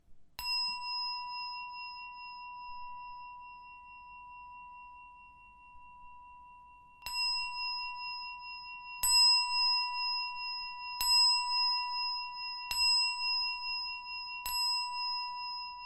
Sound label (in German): Klangschale lang